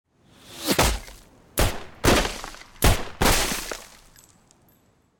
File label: pistol